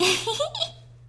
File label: cheerful giggle; osu